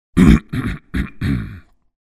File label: Man cough clearing throat